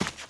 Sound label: gravel, footsteps, run; boomer